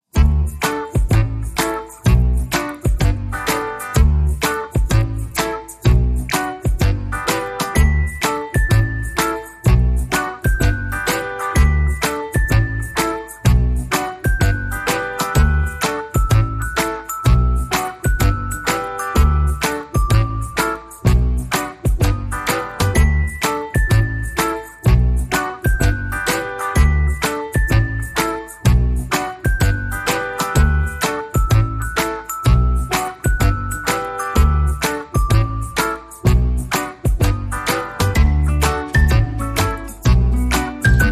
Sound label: No Lead Guitar